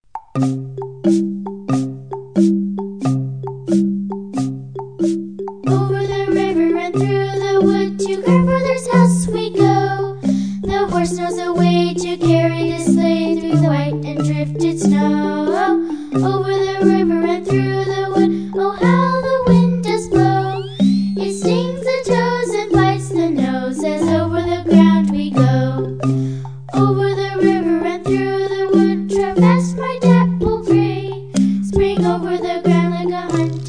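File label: Over The River and Through The Wood with vocals, marimba; merry marimba christmas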